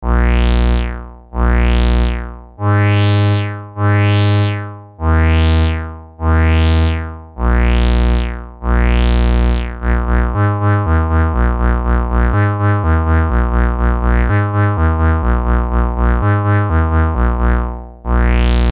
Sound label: adsr vcf